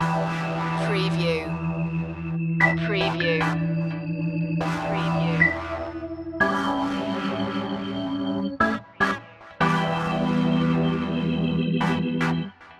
latin flavor loop

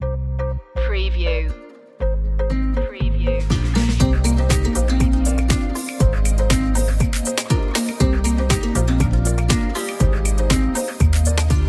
tropical sun

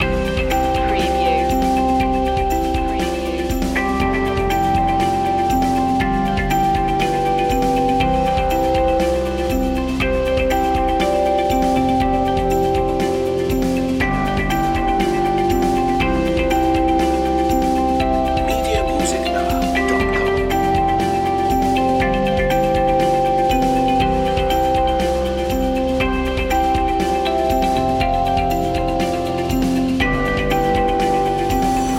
corporate innovations loop

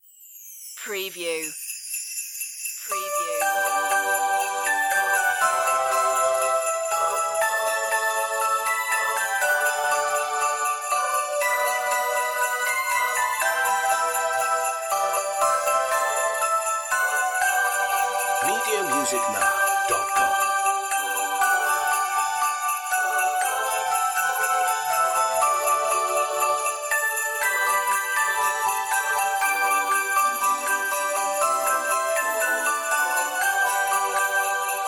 merry christmas bells with choir

